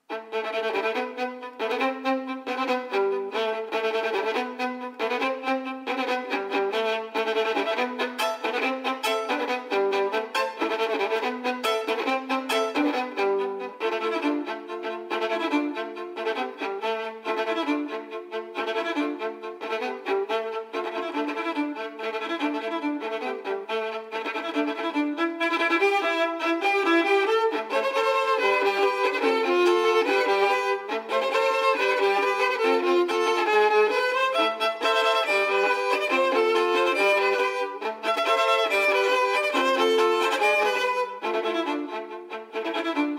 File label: VIOLIN COVER